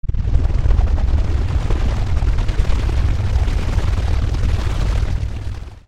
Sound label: earthquake quiet, Earth Sound Effects; Earth sounds